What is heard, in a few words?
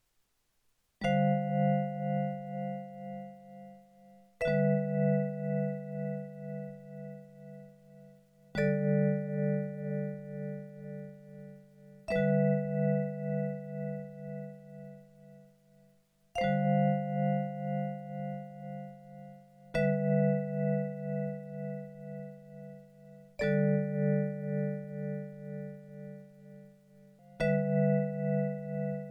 Piano vib Main Verse